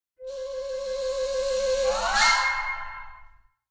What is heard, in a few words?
Mobile game playful fun level up